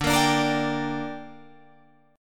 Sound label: D Suspended 4th Sharp 5th D down Guitar Drop D, chord